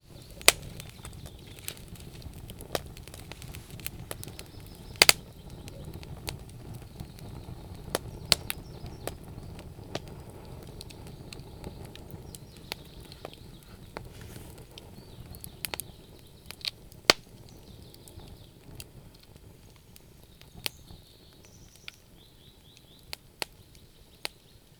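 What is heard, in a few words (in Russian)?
zvuki kostra, campfire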